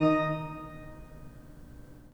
PIPE, 3DOrgans

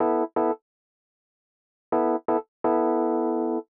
125 Rhodes A, Keys, Nu Jazz House; PowerFX - Nu Jazz House

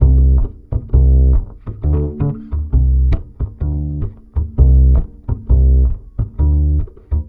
BASS, 132, Balkanish Traditions; USB Soundscan vol.63; AKAI

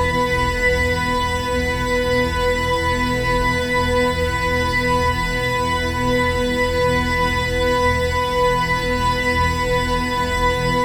CELTIC PAD; Bolder Sounds - Granular

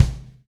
V-Pop Kick, kicks; Roland BK-5

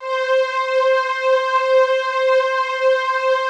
PAD, DIGI-PADS

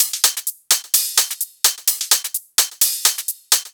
Loops 128BPM, Drumloops; Vengeance - Essential Clubsounds Vol.5

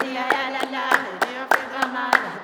DEEAWAY, CLAPCHOIR; Spectrasonics; Heart of Africa 2

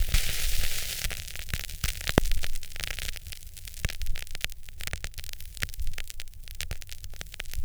VINYL R, Drum & Bass 160 BPM; Ueberschall; Drum & Bass Flash Trackz

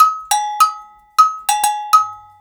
100 AGOGO

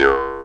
C# JAW, Primitive Instruments

